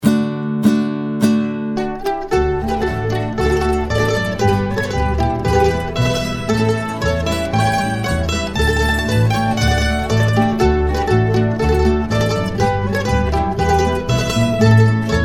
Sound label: Tyska Polska, mandolins